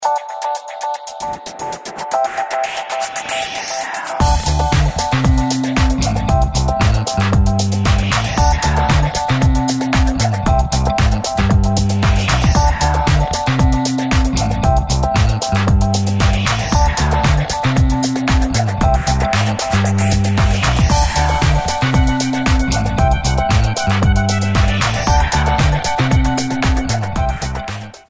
Summer Chill - 115BPM, House - Dance

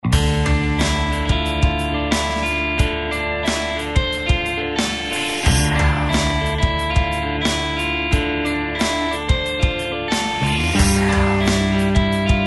Anymore - 90BPM, rock

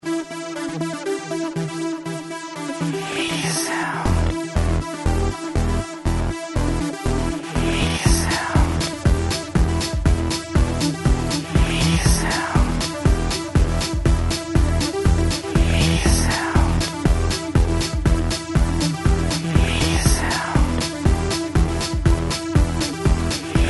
Drive - 120BPM, House - Dance

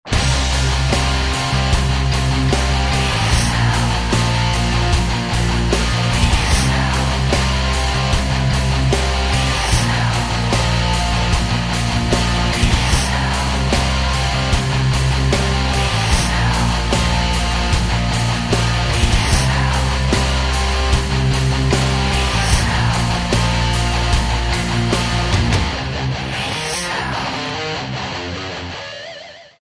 Greed - 150BPM, Rock

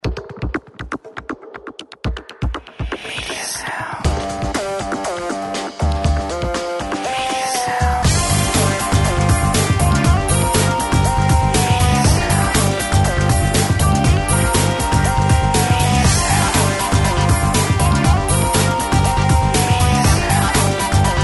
Backdrop - 120BPM, Rock - Regular

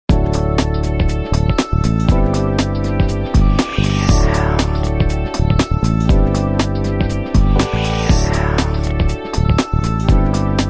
House Dance 120BPM

